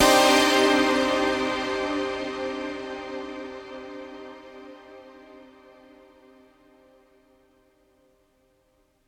Synth Blast; Terror Squid

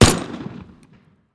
tools gun; teardown; ps5 windows xbox series xs

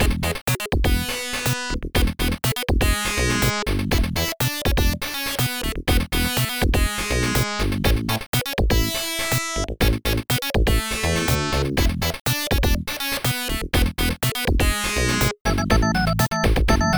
Bonus Level Theme; Radical Rex; Genesis

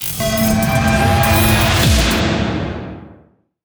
Game Intro; Hyperdrive Massacre

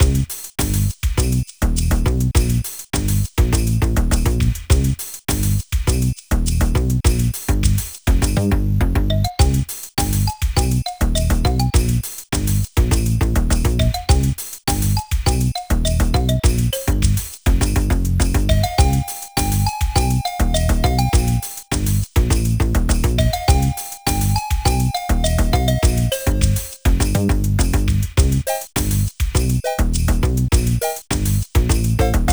Mountain Loop; Mission in Snowdriftland

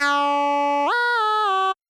Beat

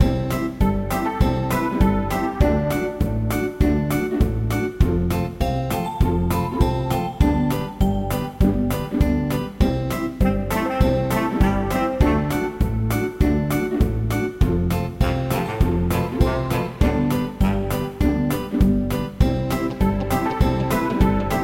Bgm Game Music

